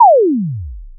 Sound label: Invsweep